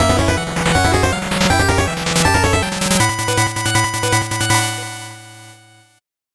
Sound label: 8bit jingle slow